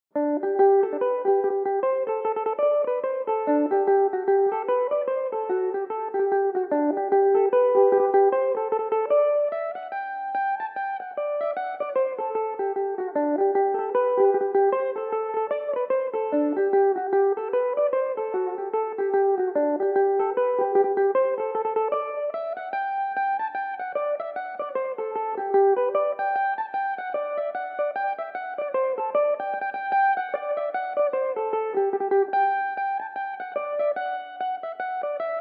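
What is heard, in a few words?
G major, irish mandolin, tunes; sean reids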